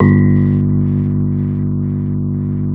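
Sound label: AMP FENDER G1, Keyboards Old School; USB Soundscan vol.09; AKAI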